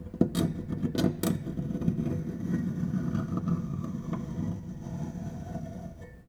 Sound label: String Scrape, Piano; Piano Miscellaneous